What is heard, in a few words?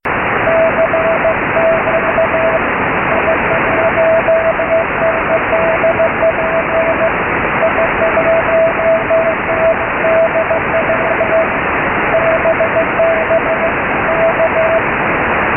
CW